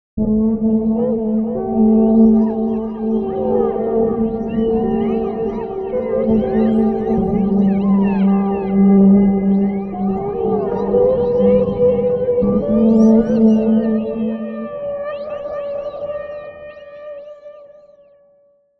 Wale

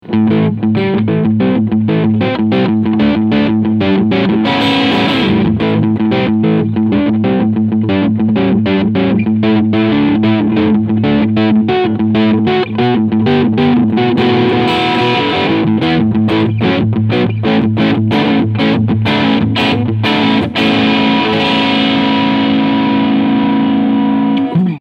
Epiphone Les Paul Classic Worn E Gitarre Overdrive Crunch Sound beide PUs

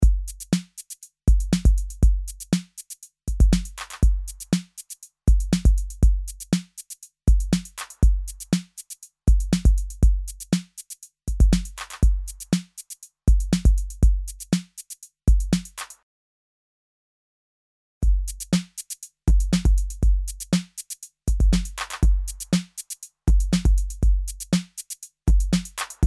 drumloop komplett; sonible smart limit

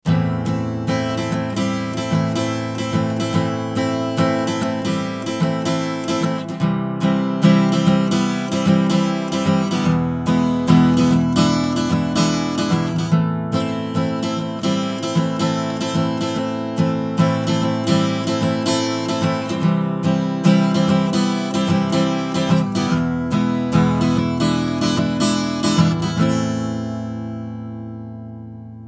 Chandler Limited TG Microphone Cassette Western Gitarre EQ B

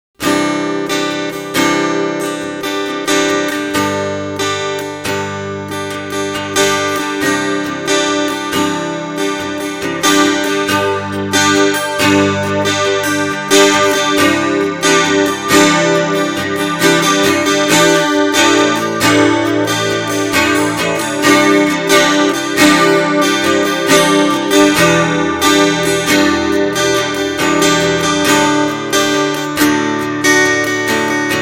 Effekt Swell

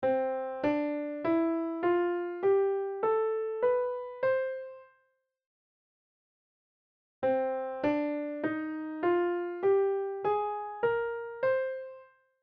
C Dur C Moll

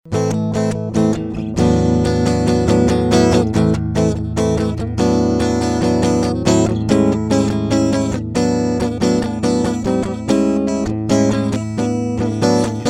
presonus audiobox go gitarre

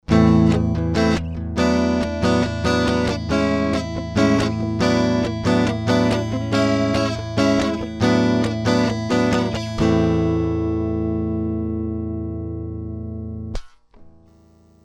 Gitarre DI Gain 25 HP 0; GAP Pre 73 DLX MKII

